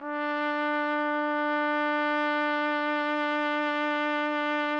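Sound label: trumpet; Roland - LA Composer vol. 3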